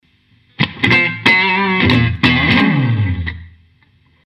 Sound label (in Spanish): Riff; ricota